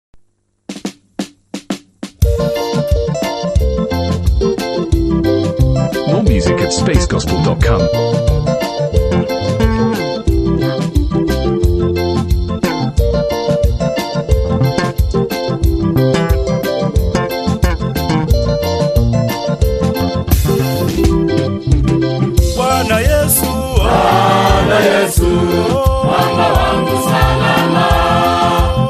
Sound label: protestant trainees choir; Kenya Prisons Staff Training College